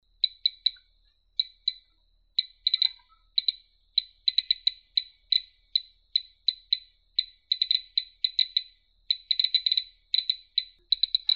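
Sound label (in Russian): счетчик гейгера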